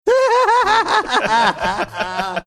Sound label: cute laugh